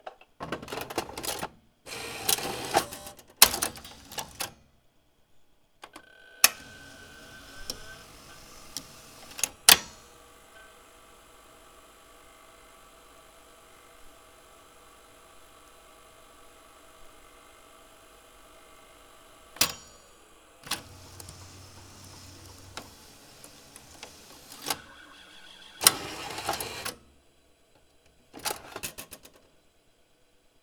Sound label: Sony VO Tape Insert, Play and Eject; SFX; IRL Recorded